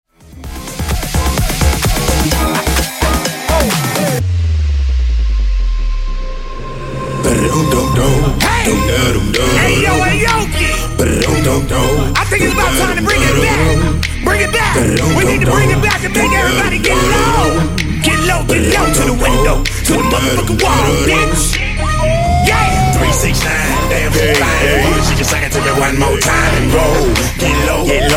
Funk Rebass 128 Bpm

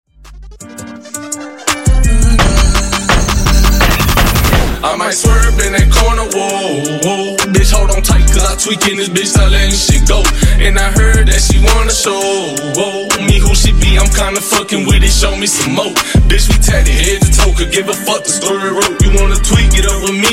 Funk Intro Outro 84Bpm

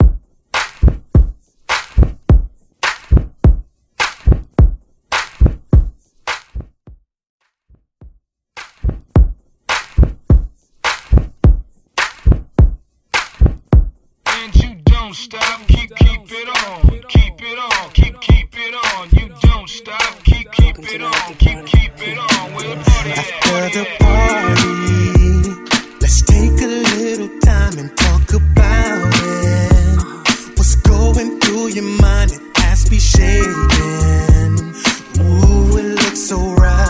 90s RB Extended Shortz Mix 105 Bpm

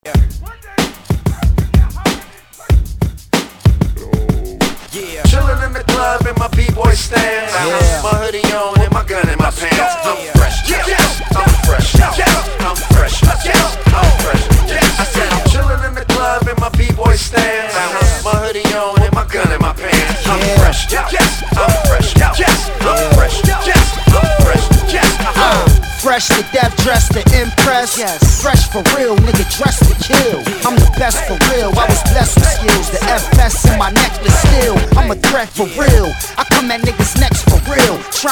Classic Hip Hop; ReDrum

